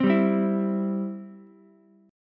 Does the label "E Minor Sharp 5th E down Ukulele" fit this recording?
Yes